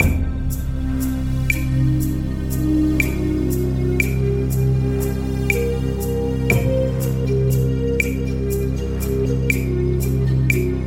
13edo MOS Rhythm Fractal Improv; 33232

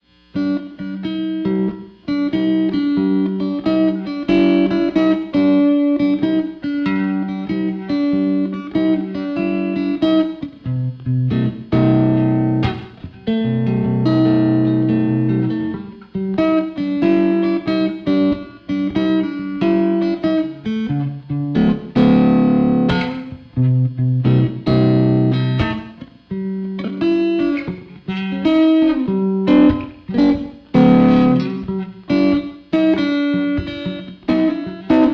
12 bar blues Kite Guitar; Aaron Wolf

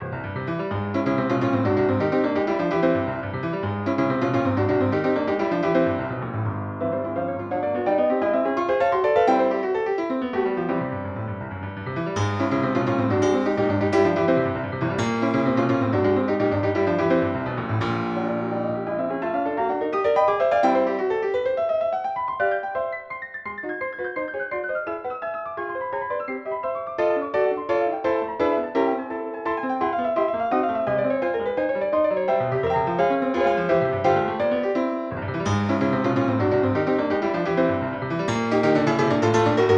tuned to sam's 17-tone temperament; Thirteen Preludes No. 1

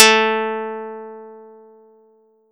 Audacity pluck octave

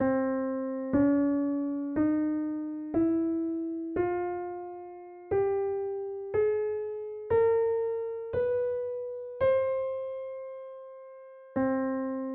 19edo Symmetrical Major; Negri

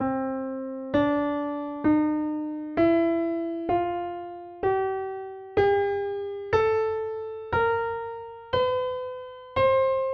Blackwood Major 15edo

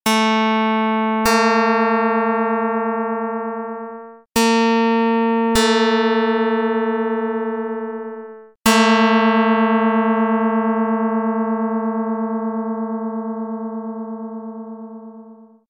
pluck; dr220

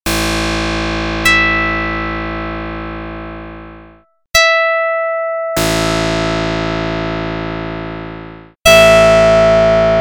pluck; Jid 12 1; dr55

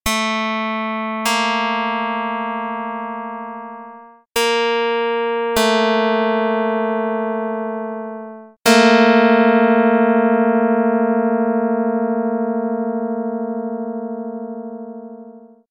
pluck 220

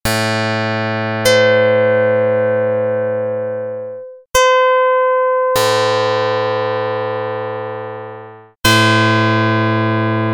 pluck; Jid 14 3; dr110

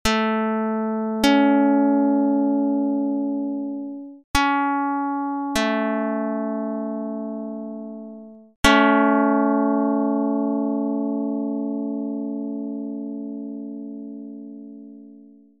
pluck dr220

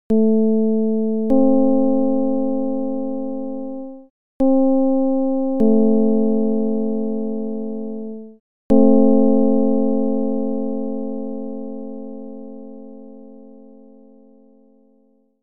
csound foscil 220hz

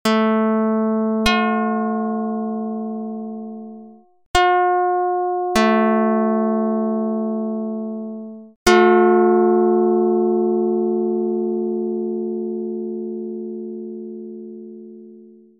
pluck; Jid 18/11

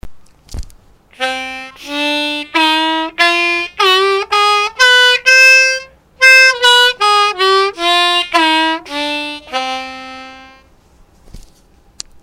Harmonica Suznak

